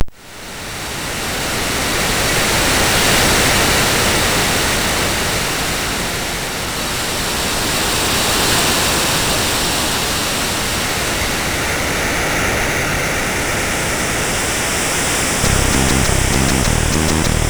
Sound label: Sea Music, MOS6581R4; hvsc